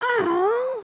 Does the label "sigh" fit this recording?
Yes